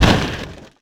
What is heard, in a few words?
wood soft, Impact; Cars; XBOX